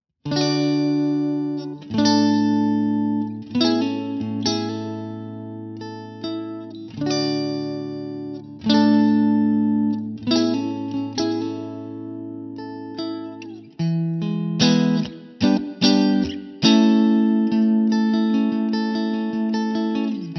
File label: clean; jensentone